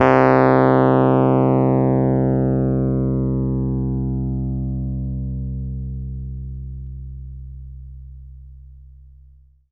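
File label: E PNO C1 FF; Synclavier Sampler Library; KEYS AND GUITARS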